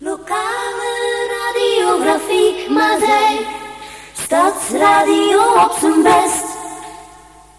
Gezongen jingle a-capella; Grafiek Maaseik

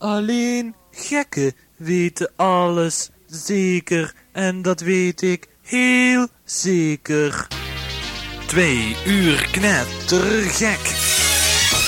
Jingle; Knettergek zeker weten

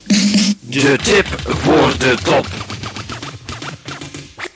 jingle; Tip voor de Top; Hitparades

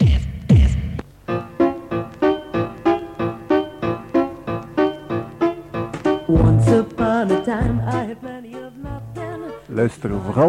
Jingle; Sander Meer Muziek

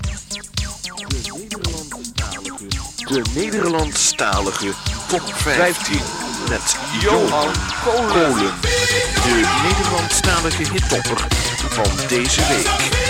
Jingle Nederlandstalige Top 15; Hittopper

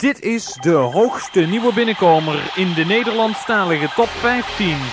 jingle hoogste nieuwe Nederlandstalige top 15; Hitparades

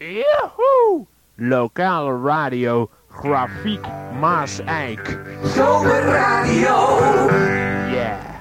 Jingle zomerradio; Grafiek

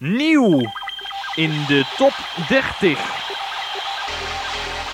jingle nieuw top 30

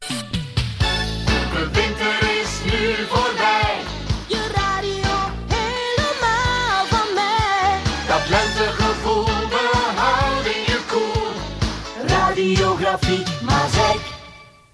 Jingle lente; Seizoenen

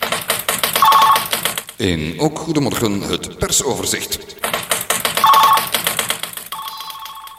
Jingle persoverzicht; Ook Goedemorgen

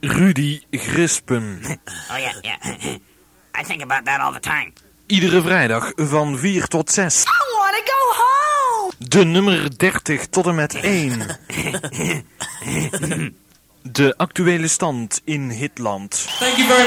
jingle a-capella; Hitstudio Top 30 Rudi Grispen

